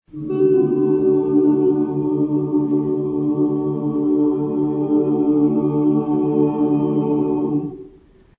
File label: High Overtone; POI Learning